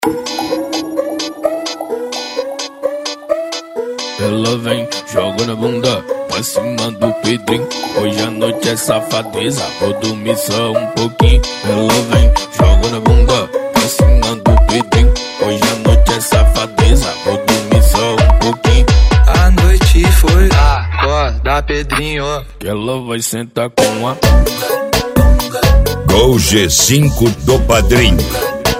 Piseiro Sertanejo

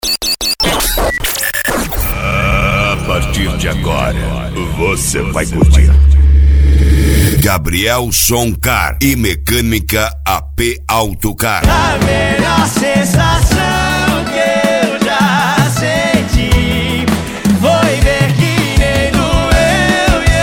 Sertanejo, Funk, Reggaeton; Gabriel Som Car e Mecanica; AP Auto Car

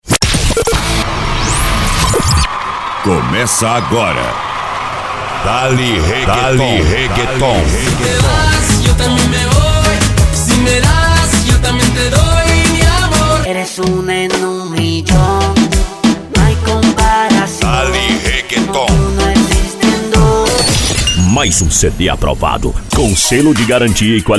Dale Reggaeton

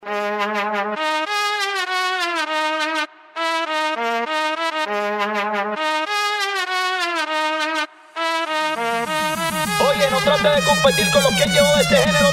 Reggaeton

